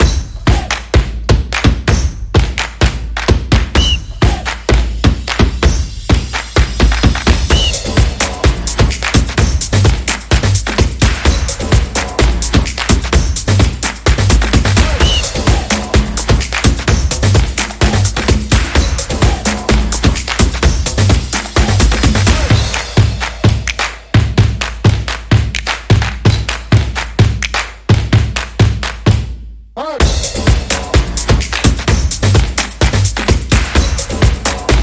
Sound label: Energetic Percussion Drum Music